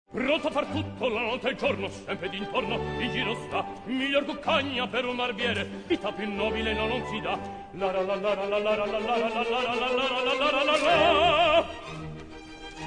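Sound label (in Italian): baritono, registro vocale medio maschile; Ettore Bastianini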